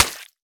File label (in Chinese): 泥巴 破坏声